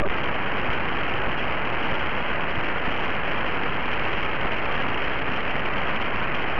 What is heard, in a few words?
static